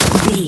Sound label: footstep snow land